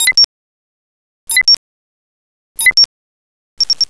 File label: timer; csde cuba